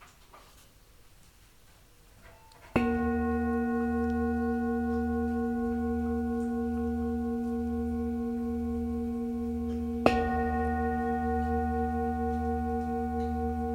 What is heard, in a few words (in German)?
klang einer klangschale; Klangbeispiel einer Klangschale